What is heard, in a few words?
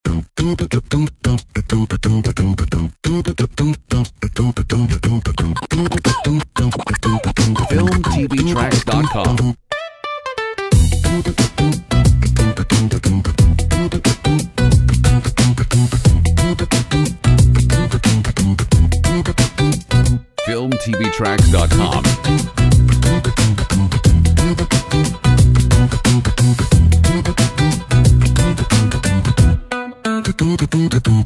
BOSSA HIP HOP; comedy funny